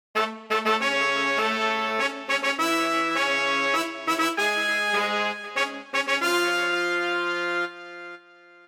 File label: Narrative Music Pop Quiz